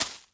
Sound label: hand grab ivy